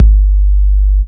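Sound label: bass drum, Drum Machines; AcidLab Miami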